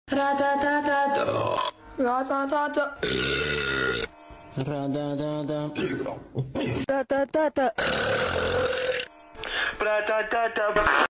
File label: burp